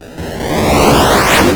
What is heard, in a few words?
Arcade Riffs